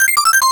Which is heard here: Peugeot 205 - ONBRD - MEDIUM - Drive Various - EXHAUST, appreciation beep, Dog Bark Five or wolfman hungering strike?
appreciation beep